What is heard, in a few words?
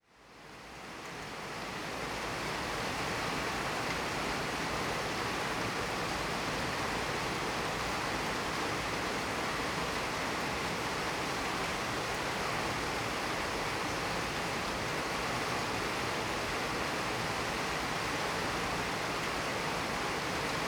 Rain, City Rain, Rain; City Sounds